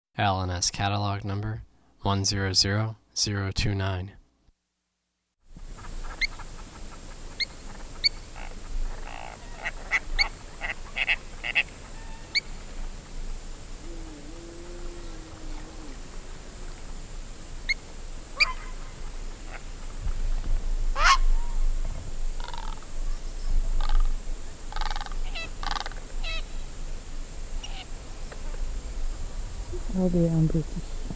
humblots heron

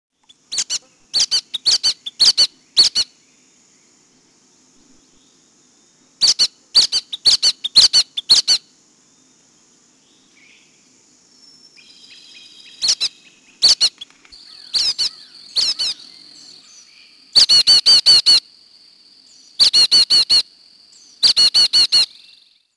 brown headed nuthatch

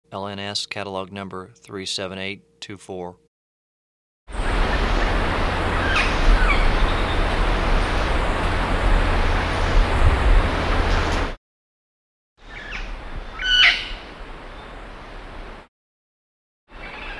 imperial parrot

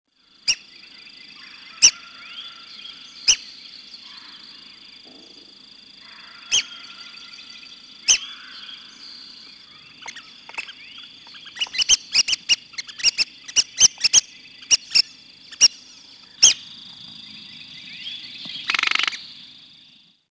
red cockaded woodpecker

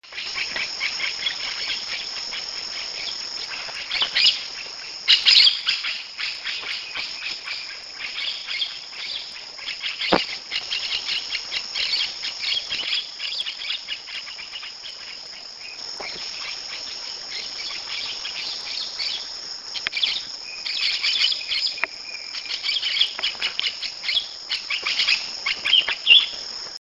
red capped parrot

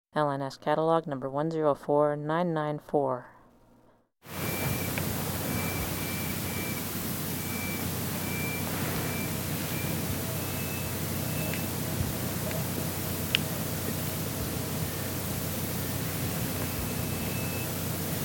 greater hoopoe lark

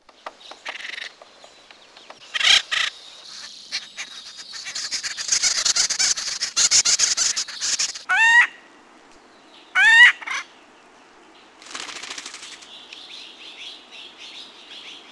acorn woodpecker

